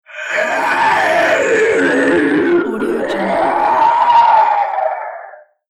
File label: zombie growling howl